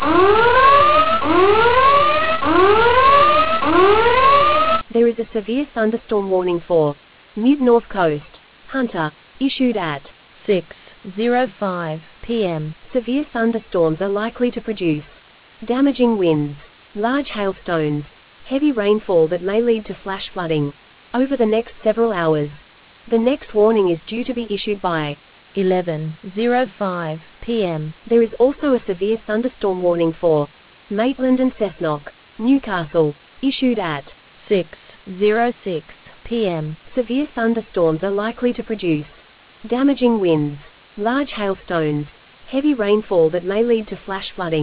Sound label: storm audio